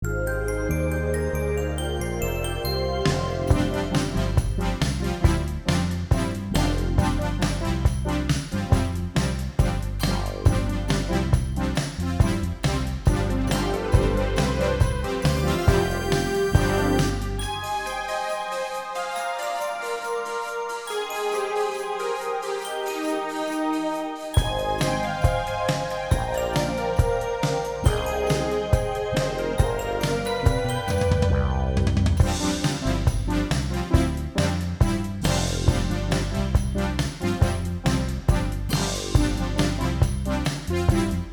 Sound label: Jazzercise